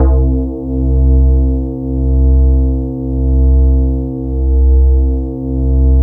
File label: E-HARP, DX HARP; Wizoo - Powered DX